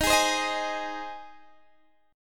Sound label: G sharp Major D sharp, guitar chord